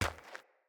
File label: Walk Gravel, Footsteps; Enemy; Mockery; Mockeries